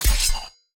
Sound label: Kill